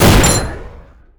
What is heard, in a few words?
Shotgun Shot Eject Ammo; Weapons